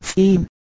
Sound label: steam